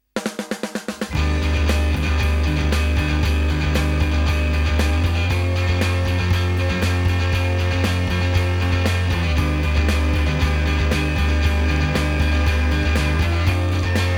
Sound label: Tone Burst